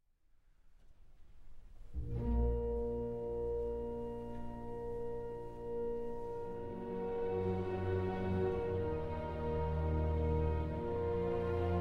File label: Symphony in B-Flat Major, Adagio